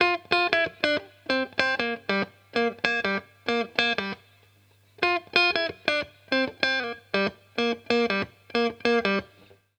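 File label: guitar, Roots Funk; USB Soundscan vol.41; AKAI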